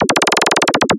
Arcade Movement, Video Game Sounds; 1001 Sound Effects

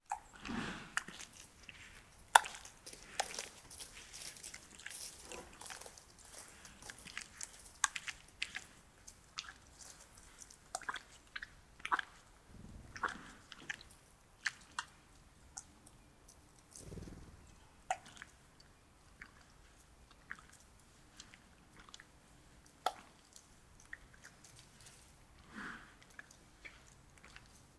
Siberian Tiger Chews, Drinks, Licks and Moves; Tigers; Sound Ideas

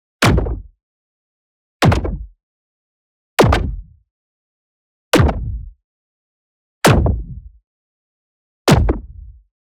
EXPLOSION SMALL Short SciFi Transient; boom library; Matter

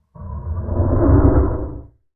Creature Growl, Horror Effects

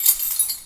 Pickup Key; SFX Kit; Pickups

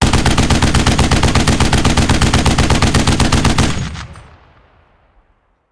Machine Gun; Sound Ideas; Guns

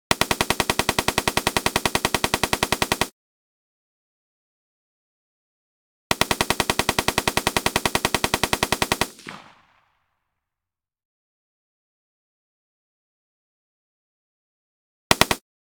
Ak-47 Simulation, 3 Shot Burst; Hollywood Edge; Heavily Armed

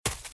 Footsteps Leaves Sprint Left; RadwinIsland

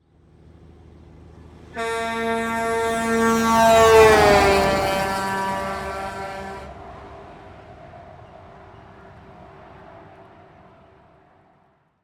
Semi Truck Horn; Large Vehicles; Blastwave

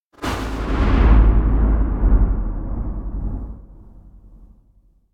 Whoosh Into Impact Metallic Tension - Whoosh; Hollywood Edge; sound designer's toolkit v2

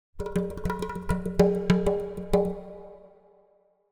Drums Exotic Percussion Beat Finale; Hollywood Edge; sound designer's toolkit v2

